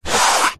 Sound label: household book thick hard back cookery slide table; zapsplat